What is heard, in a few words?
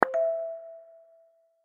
notification sound